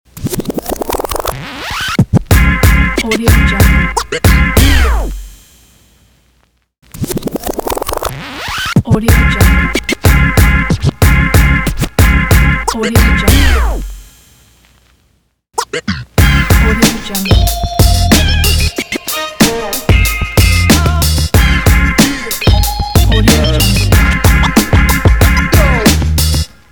upbeat hip hop logo; redoctopus

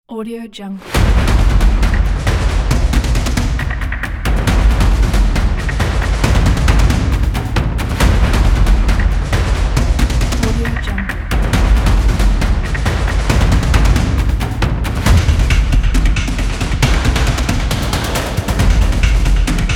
action drums

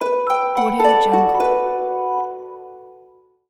harp discovery sound

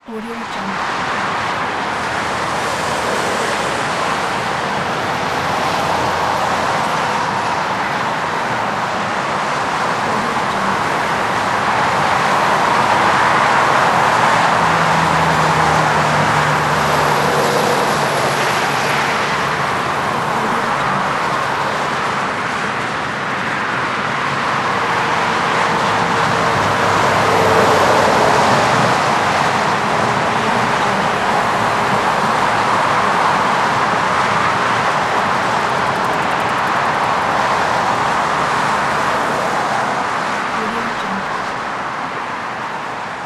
highway

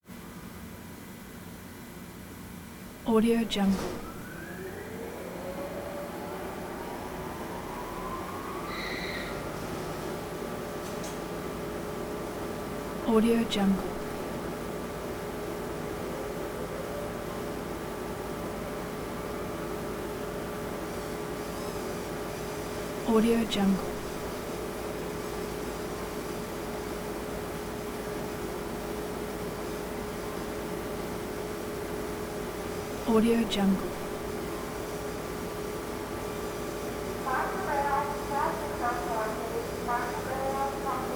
web printing press boots up runs

